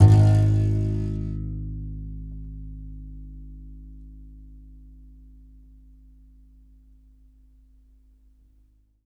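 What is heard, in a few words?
contrabass balalaika, Plucked Strings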